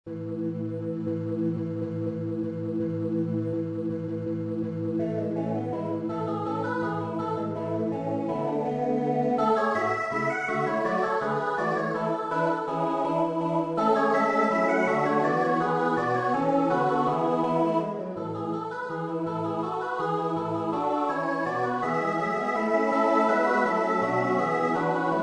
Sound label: midi; twardowski; a kiedy jo jechal